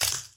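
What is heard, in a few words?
quiet crack of a breaking mirror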